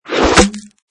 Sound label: Arrow Impact Wood, Archery: Arrow whoosh and hit. Impact into wood.; Bows and Arrows; LynneMusic